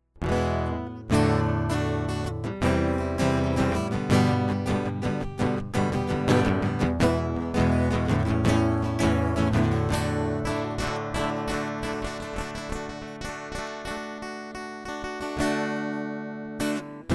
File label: guitar intro